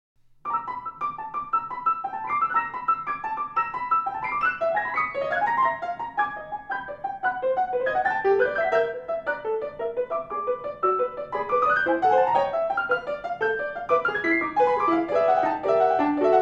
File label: Sparkle